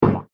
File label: Platform Game Heavy Drop Sound; Video Game Sound Effects; electronic sound effect